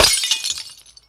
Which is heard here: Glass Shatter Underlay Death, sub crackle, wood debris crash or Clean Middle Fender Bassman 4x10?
Glass Shatter Underlay Death